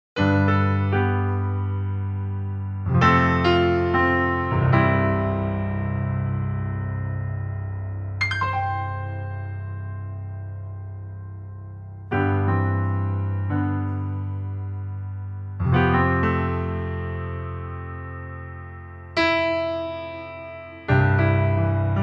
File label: Yamaha C7, piano